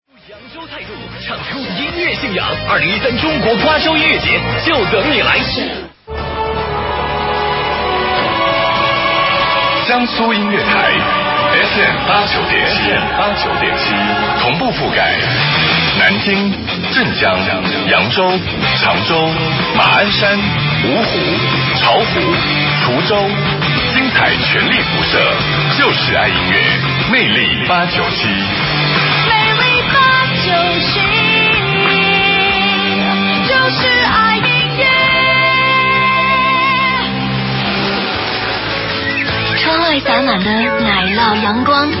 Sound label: Music Radio; jiangsu